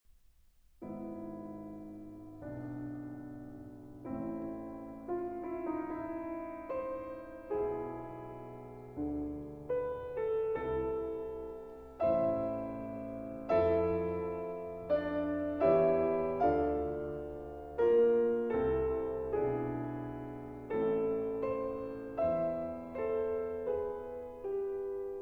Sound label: Piano Sonata in A Major